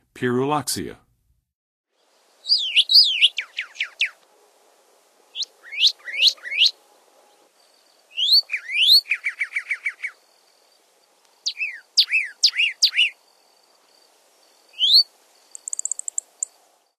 Pyrrhuloxia, Songs of Birds of North America; Western USA; Western USA Songbirds

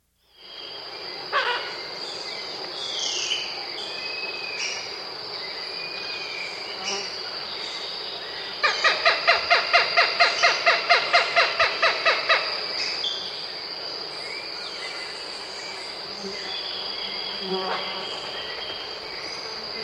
Bicolored Hawk, Nature's Songs, S. America

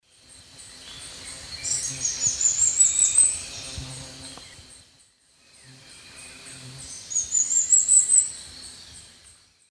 Heliodoxa schreibersii, song; S. America; Eastern Lowlands

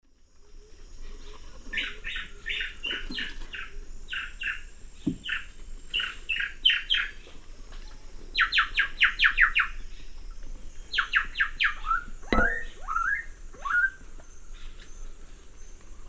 Donacobius atricapilla, alarm calls; S. America; Eastern Lowlands

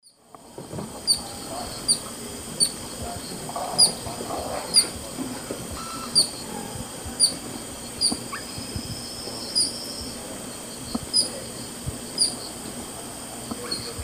Heliomaster longirostris, feeding calls; S. America; Eastern Lowlands

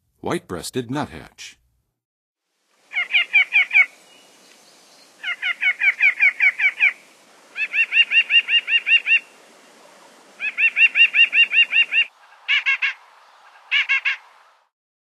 White-breasted Nuthatch; North America; Western USA; Western USA Songbirds

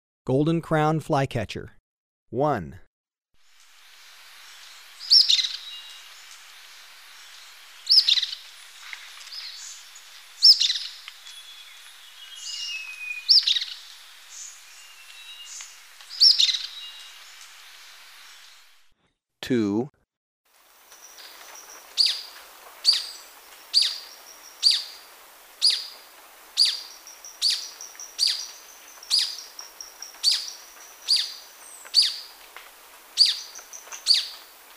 Golden-crowned Flycatcher, Birds of Ecuador; S. America

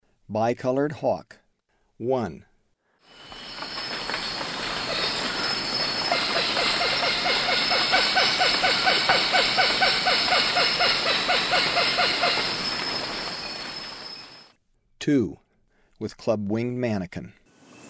Bicolored Hawk; S. America; Eastern Lowlands

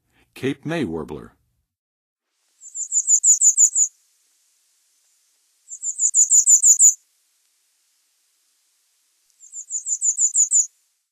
Cape May Warbler, Songs of Birds of North America; Western USA; Western USA Songbirds

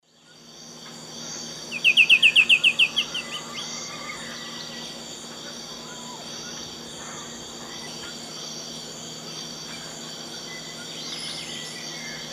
Pachyramphus marginatus, song; S. America; Eastern Lowlands

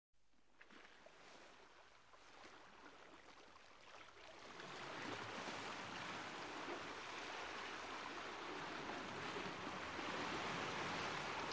Sea & Dolphins; Sounds of the Earth; Sleep Sounds of Nature

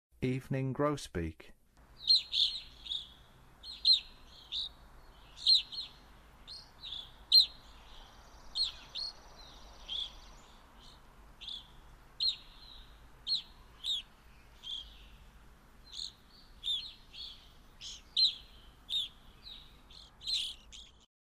Evening Grosbeak; North America

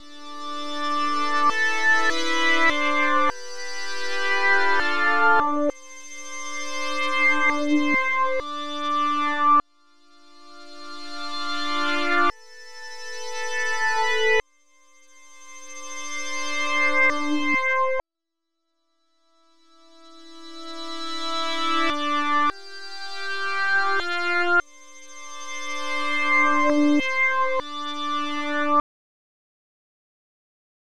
Chorus Synth Backwards; Rock; Synths & Keyboards

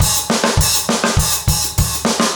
basic funk 100 fill

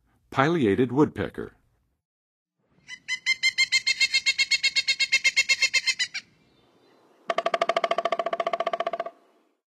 Pileated Woodpecker, Songs of Birds; North America; Western USA; Western USA Songbirds

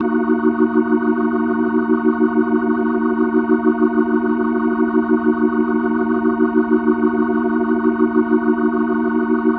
Organ D# Min, Rock Organ Licks

